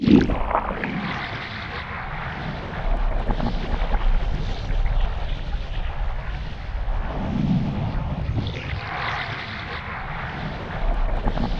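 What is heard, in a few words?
player enter tbeam loop; Portal 2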